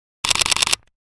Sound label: Camera shutter clicks multiple shots